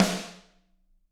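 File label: snare drum; Bob Clearmountain Drums I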